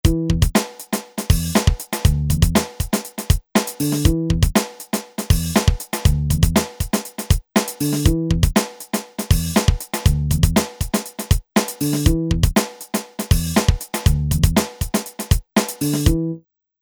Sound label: Rap Hip Hop